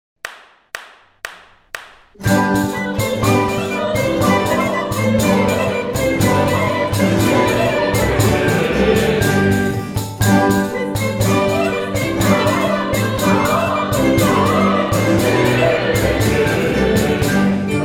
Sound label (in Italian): coro